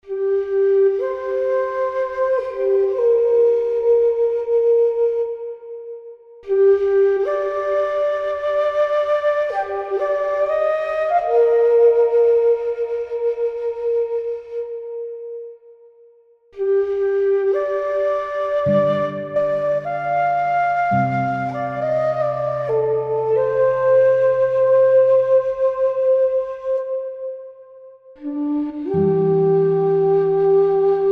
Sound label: flute; apollo